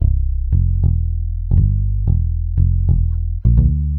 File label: bass jazz